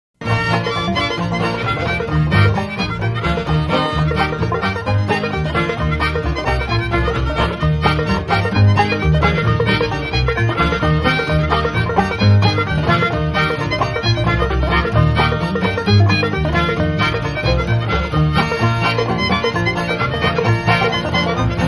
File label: instrumental